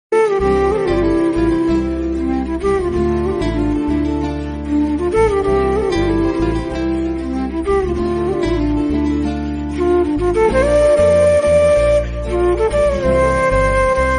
best old instrumental ringtone old is gold

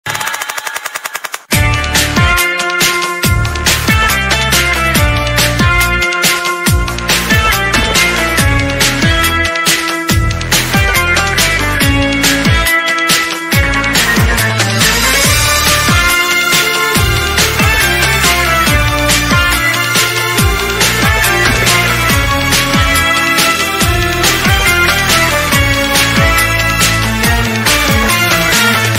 mass bgm ringtone; billa; ajith; billa theme music; tamil movie bgm

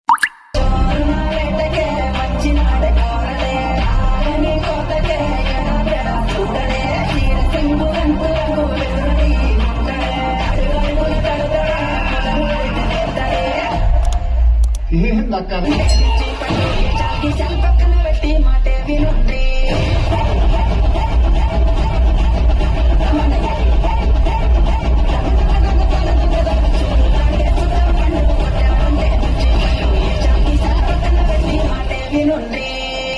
climax fight song bgm ringtone; guntur kaaram movie

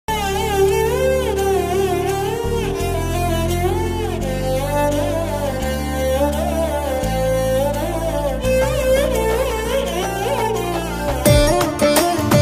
heart touching bgm violin sitar song ringtone; Hosanna Ministries

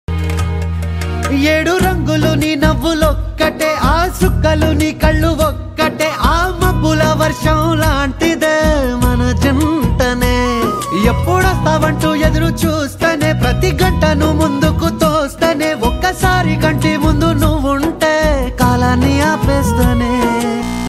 love ringtone

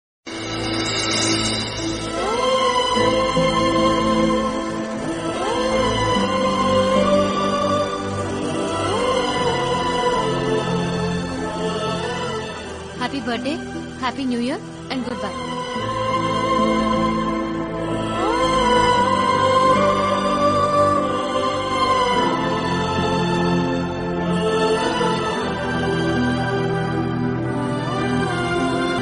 movie emotional bgm alone bgm; oye

